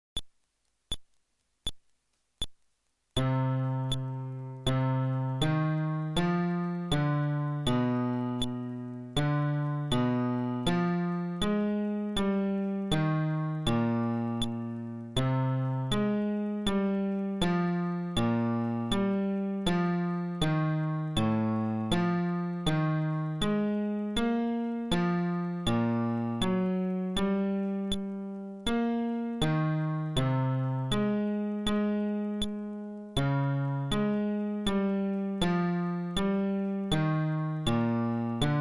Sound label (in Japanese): フレットレス (テンポ80)